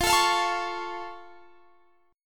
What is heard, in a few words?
F Augmented F down Guitar Standard; strummed; F+ chord diagram